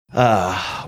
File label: disgusted sigh